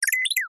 science fiction robot chirp beep very short slightly cartoonish